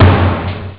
sdrum; Amiga Soundtracker Sample Packs

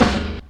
Snare, Percussion Hits, Snares; Jungle; Zero-G Jungle Warfare 1, 2 & 3; 90 s Sample CDs

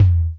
Toms, Elektron MachineDrum; SPS1 MKII

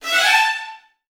VN FX, Virtuoso Strings

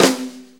4-4 Snare Flam; 4-4 Drum Loops; Flams; Drum Tools

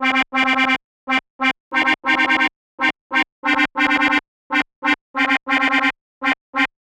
Arp 140bpm C, Trance; Zero-G Trance Inducer; Arps 140bpm; 90 s Sample CDs

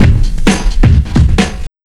LOOP FX, Big Beat Drumloops; USB Soundscan vol.25; AKAI

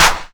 KLAP, Big Beat; Big Beat Samples & More; AKAI; CLAP KIT

